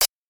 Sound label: cym